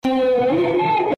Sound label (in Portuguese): Gemi Super Low